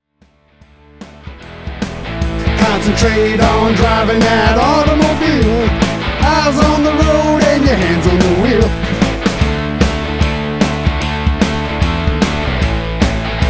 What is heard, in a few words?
Rock Cue